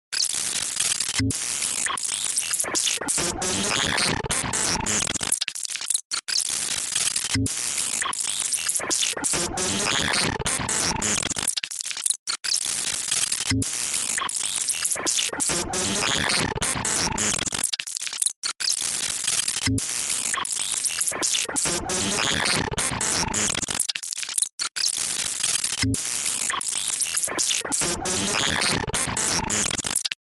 Computer Glitch Sound Effect; ambience

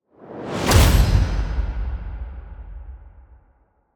Whoosh Hit

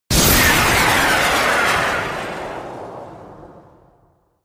Missile; gun

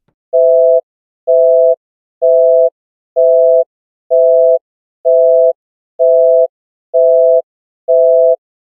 Busy Signal, phone

